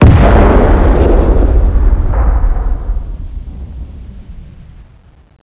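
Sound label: uav explosion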